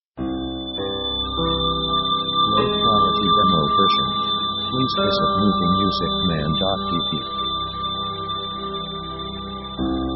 atmosphere cinematic; Background themes

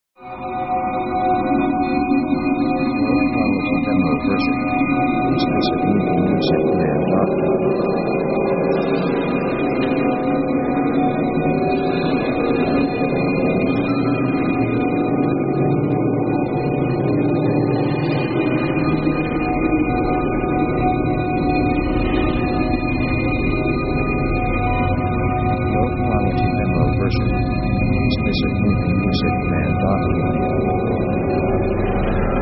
spooky place; synth themes

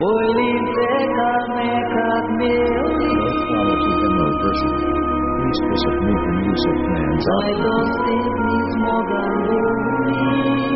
orchestra vocal romantic; orchestra themes

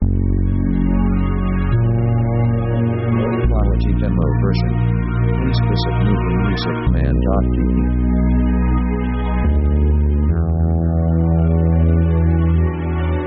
synth sphere

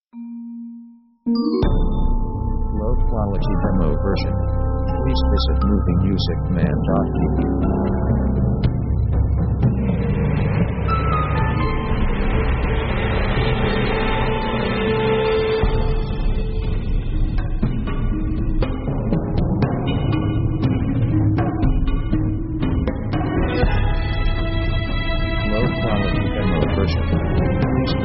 orc dark

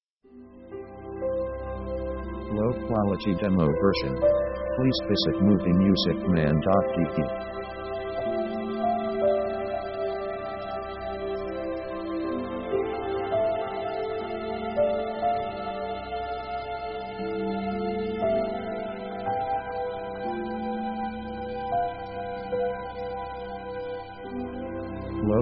peaceful contemplation

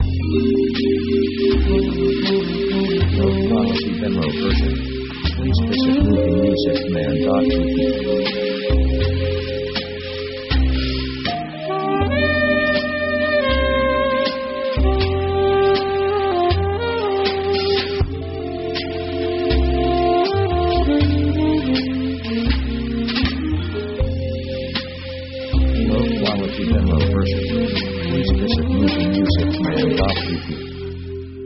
Cool Slow Opening Theme

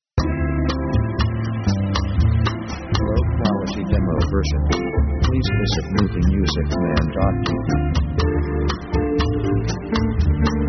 jazz chill out bossa; easy themes

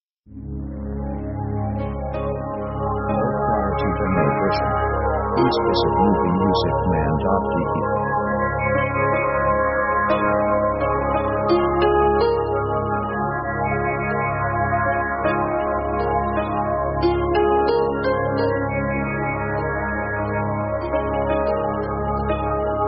pop transcendental connection; synth themes

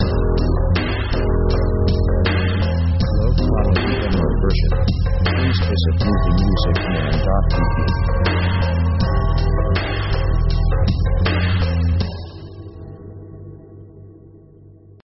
strange 80bpm

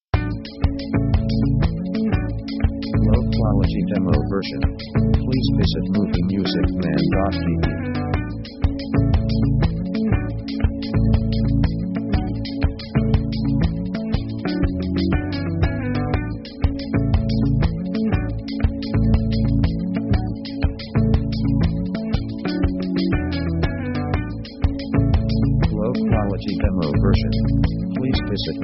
guitar groove

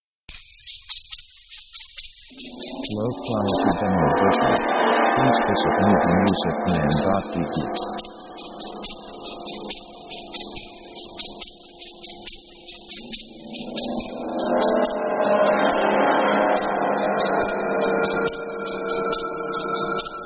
thriller; Dark mood

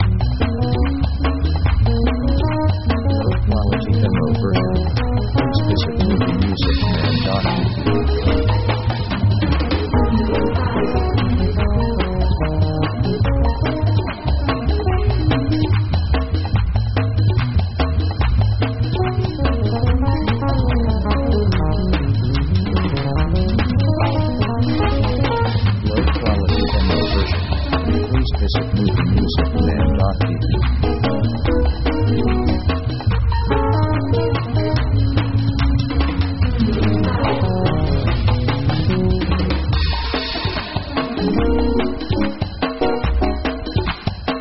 Jazz; synth themes

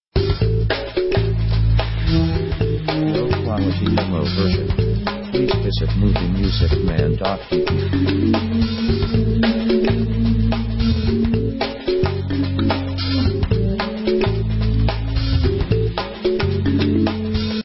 pop kalimba sketch; world themes

